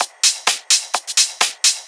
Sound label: Discohouse Loop 128 BPM